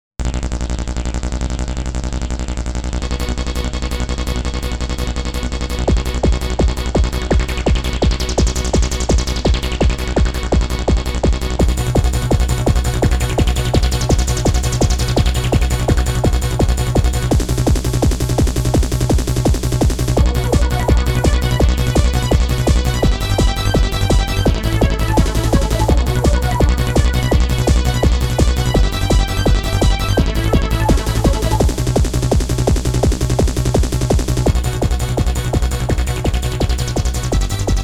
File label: raver